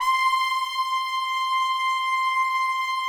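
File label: PAD, atmospheric pads; Best Service; XX Large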